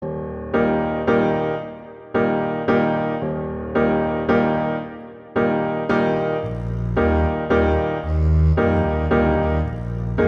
Allegretto pomposo; Saint-Saens; Elephant

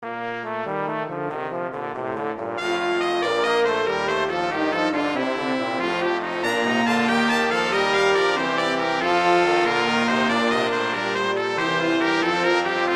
Gigue; Pachelbel; Canon; Quintet

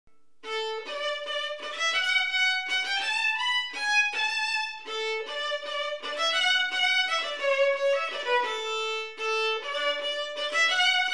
Melody, Violin; Fitzpatrick; Violin Melodies

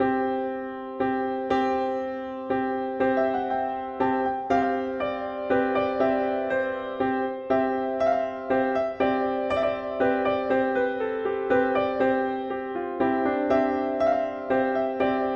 Spring Dance; Grieg; Norwegian

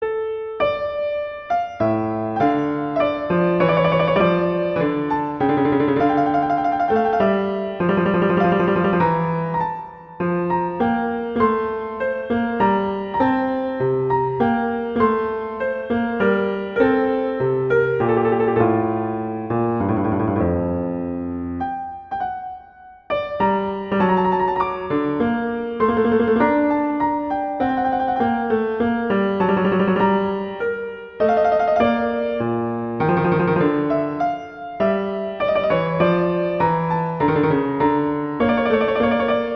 Cantabile; Telemann; Canonic; Sonata 5

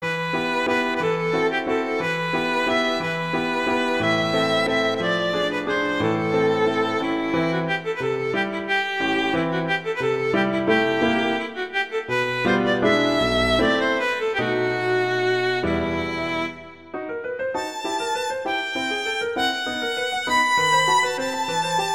Waltz; Grieg; Lyric Pieces Op38

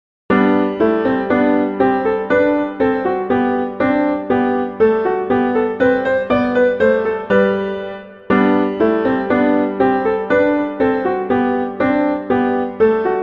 Gavotte; Bach; Eng Suites; Suite III

